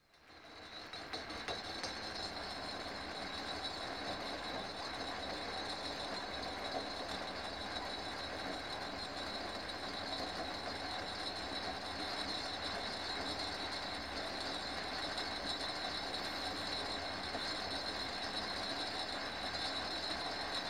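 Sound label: Funicular Railway, External, Prague; The Czech Republic; BBC Sound Effects Library; Slovakia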